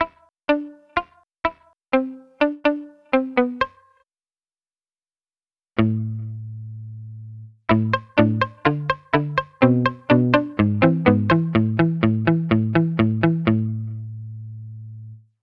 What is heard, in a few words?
palm muted guitar